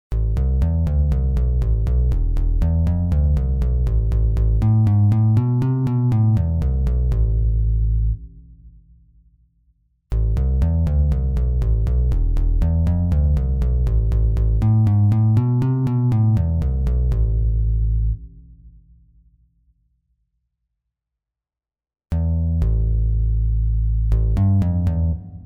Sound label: bass; Sugar Land